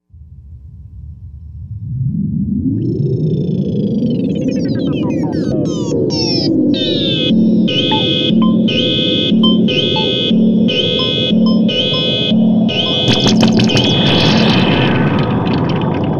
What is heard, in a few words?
moog sample